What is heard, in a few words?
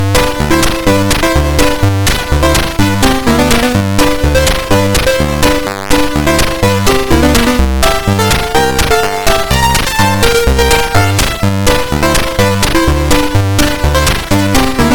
Synth, Demos, SIDVoice